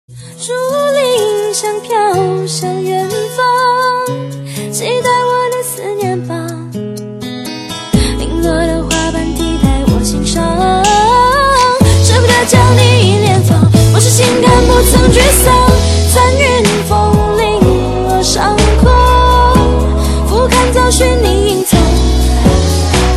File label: Chinese Style